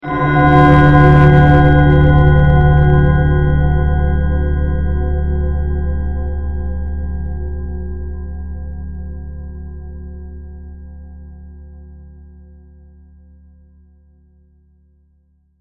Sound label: Synth, Stab, Mysterious; Bell